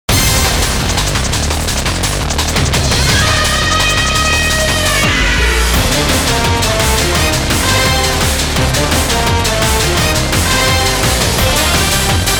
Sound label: Chance BGM; Zou Savanna; Elefant Console